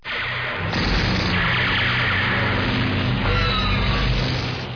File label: Aerial Battle